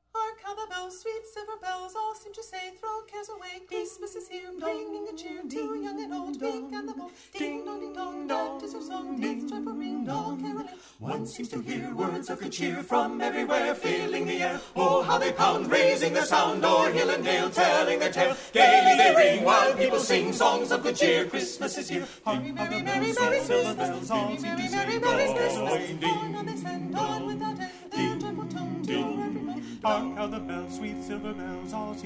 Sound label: Carolers; St Louis